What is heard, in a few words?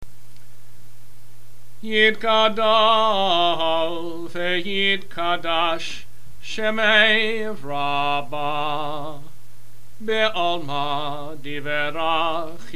hatzi kaddish